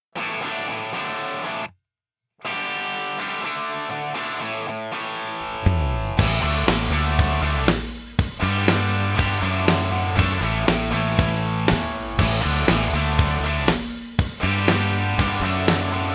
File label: guitar instrumentals rock n roll